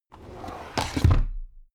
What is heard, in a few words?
drawer cabinet crammed close heavy